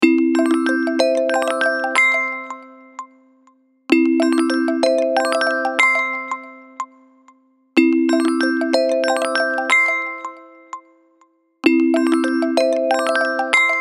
Melodic Rolling Awake; Alcatel Pixi 3

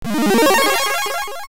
Videogame message; Nokia N79; Audio themes

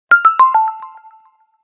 Alert SMS, Windows Phone 7; Microsoft Windows-Phone-7

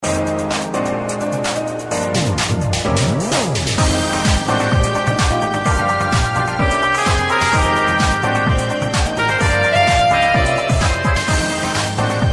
Cheerful Vein; KP330

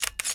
camera click; QMobile Noir-A1

